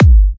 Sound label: G, kicks; markus hakala kicks